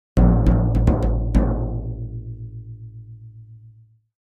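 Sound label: Drums Toms C Low Accent, drums tom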